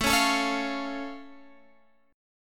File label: C sharp Augmented, A